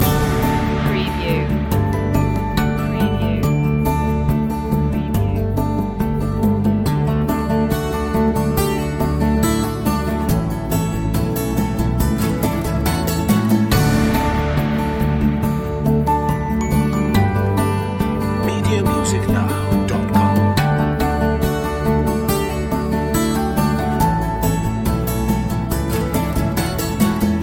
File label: earthbound loop